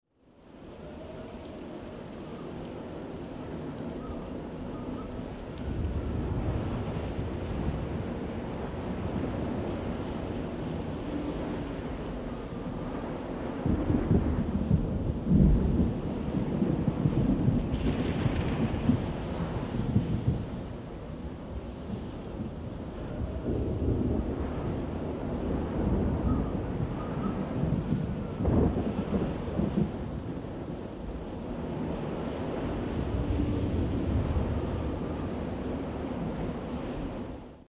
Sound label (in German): Wind